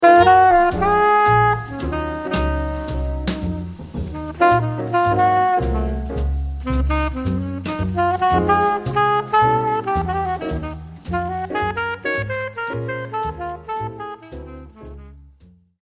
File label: alto solo